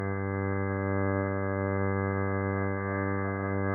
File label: Open G2 with bandpass, fiddle